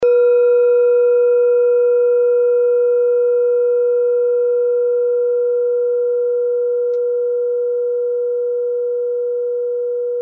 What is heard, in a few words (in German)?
kleine klangschale